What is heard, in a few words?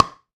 Pickleball Paddle Hit Ball Hard